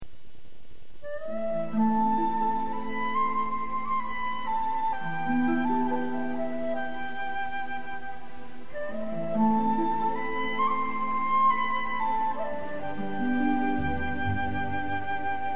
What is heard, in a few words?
whistle